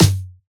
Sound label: drum hit clap